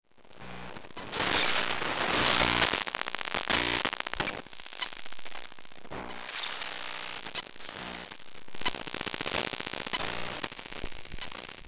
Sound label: Laptop glitches and noise